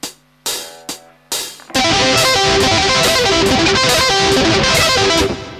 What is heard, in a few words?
advanced arpeg; advanced lessons advanced arpeggios